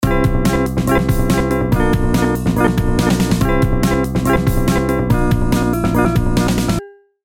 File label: disgusting beat